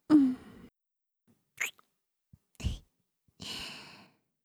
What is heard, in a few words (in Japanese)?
ちゅっ; 愛好化(褒めまくり)